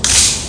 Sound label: reload